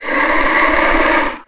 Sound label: moan